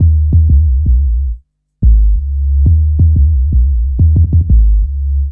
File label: Rude Bass, Drum & Bass; Sonic Foundry; Fluid Dynamics Drum & Bass